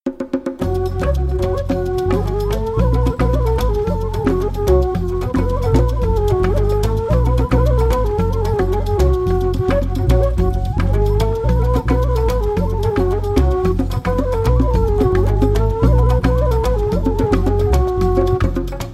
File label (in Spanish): Rumba argelina